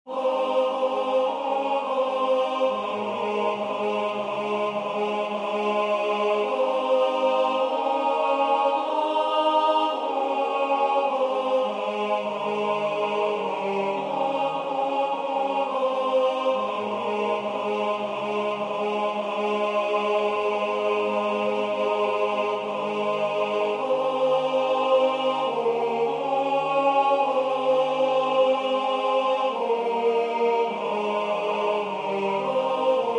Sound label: lead kindly light T; newman